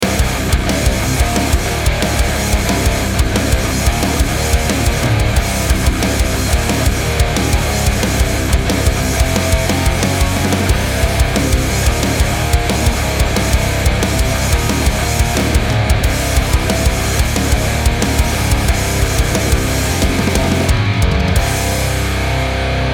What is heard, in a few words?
aggressive metal 90 bpm short